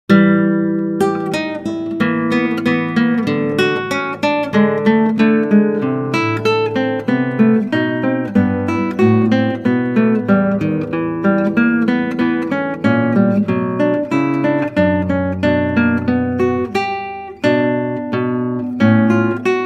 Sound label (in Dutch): Klassieke Gitaar; Bach 50 Solos